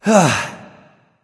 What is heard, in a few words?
nv sigh; smite; voicepack; xbalanque